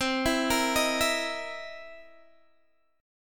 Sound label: C 7th Sharp 9th C Guitar Open G